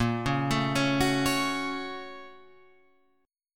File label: A sharp Minor Major 7th Sharp 5th A sharp Guitar Open F; A#mM7#5 arpeggiated; JGuitar